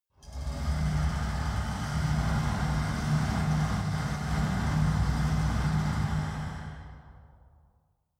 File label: mechanic rattle